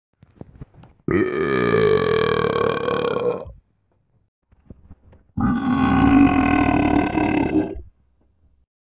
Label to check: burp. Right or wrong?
Right